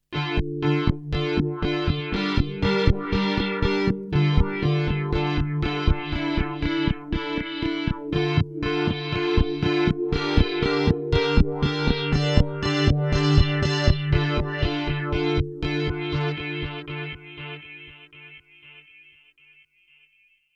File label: Wave Duett